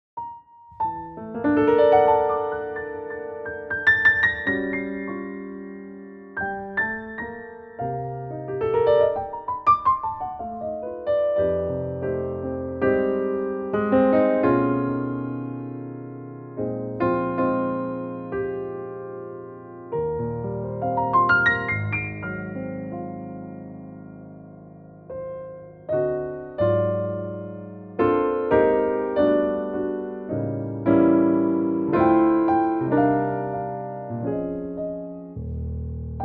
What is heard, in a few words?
jazz concert